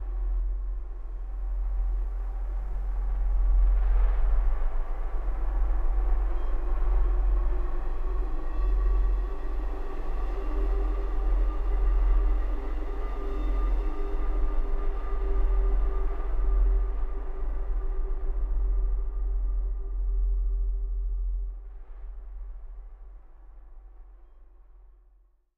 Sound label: Music - Intro Ambient; Spider-Man 2: The Game